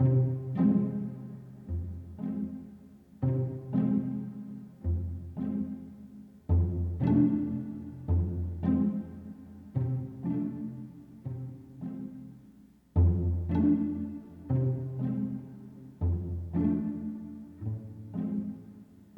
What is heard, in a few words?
Sniper Loop Fast; Hitman Blood Money